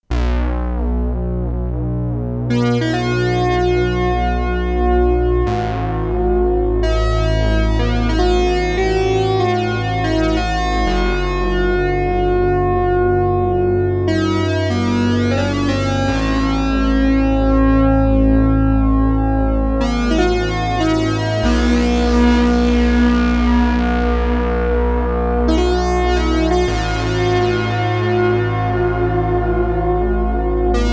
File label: OBSX Two Voices